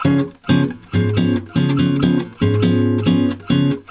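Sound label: C# 7 guitar, Brazil Bossa; USB Soundscan; AKAI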